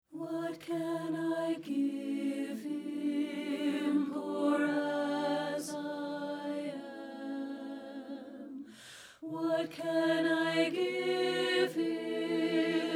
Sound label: alto; satb